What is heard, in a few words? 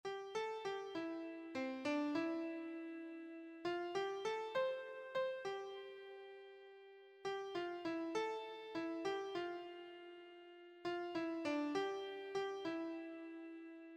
eye is on the sparrow, keyboard